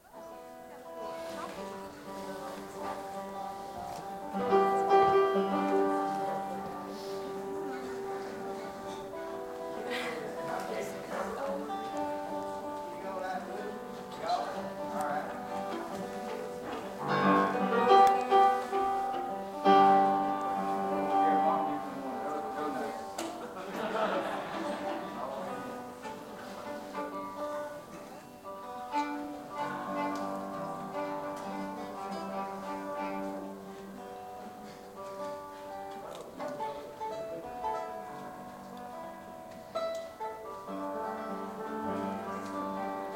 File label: Tuning; Vassar Clements; Knox TN